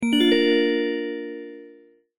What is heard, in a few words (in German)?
Xylofon